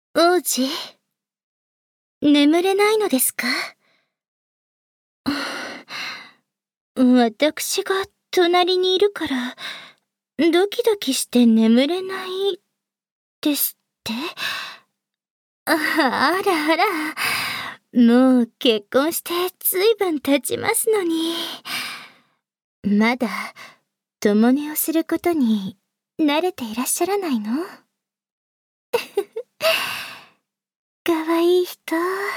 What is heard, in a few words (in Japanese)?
上品エッチ